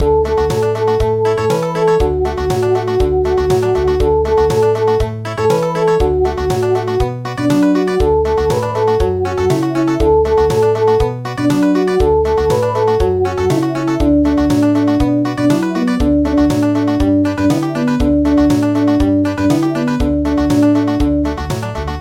Country

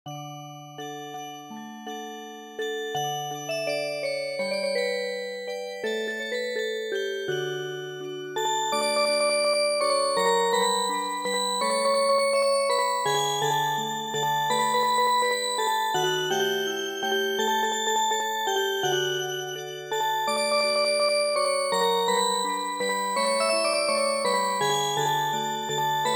Music Box, midi

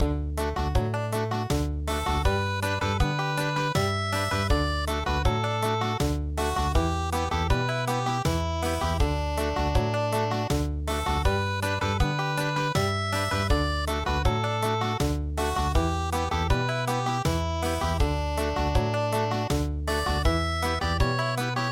Country, midi; Schlaf ein mein Blond-Engelein

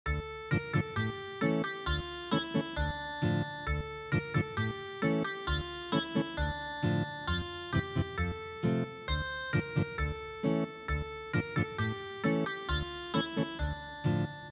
Ethnic Guitar

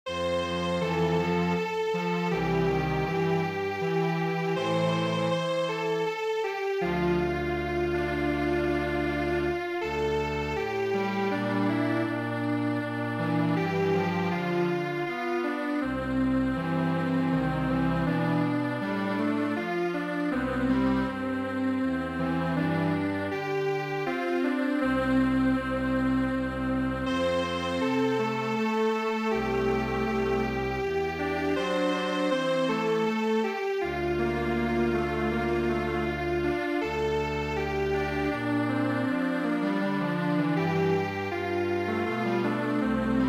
Strings Quartet, midi traditional

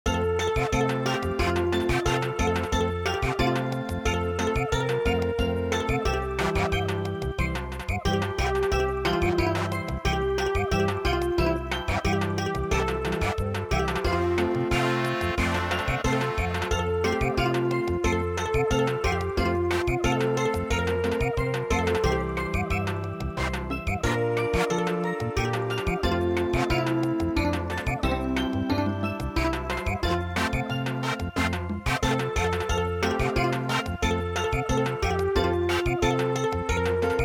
Samba

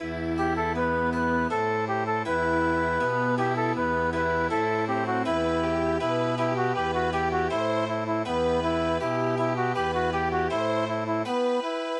Chamber Music

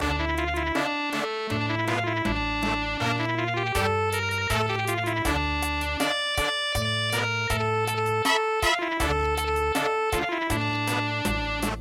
cha cha cha; koganemushi

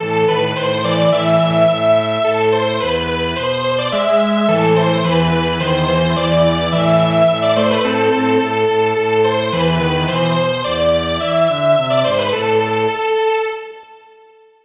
Strings Quartet, midi traditional; Why why you did not come

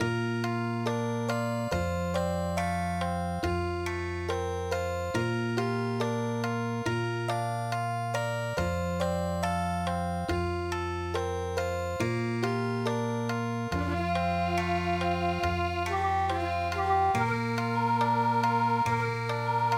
Irabu Tougani Okinawa

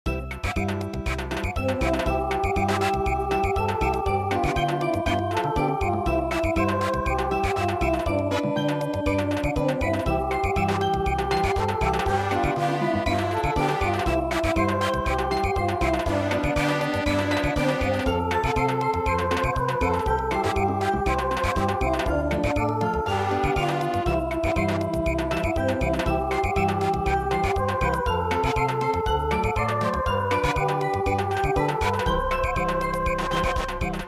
Samba

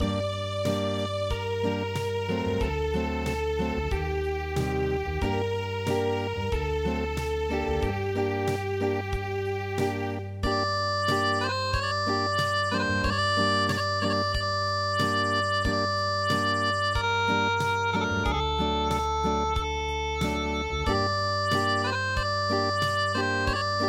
Acoustic, midi traditional

